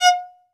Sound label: Synclavier Strings, SOLO VI; ILIO